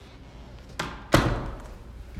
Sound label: door close